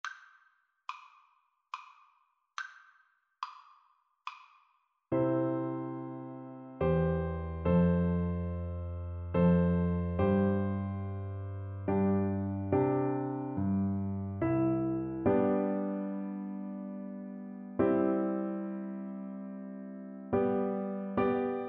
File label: mozart minuet, Trombone